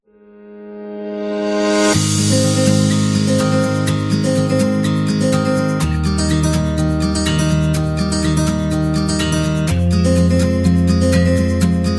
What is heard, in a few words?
Wedding Film; conference